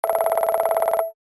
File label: Digital interface scrolling menu ui